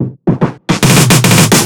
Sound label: 145 BPM drum fill; Psytrance; Drum Fills; Singomakers; Infected Psytrance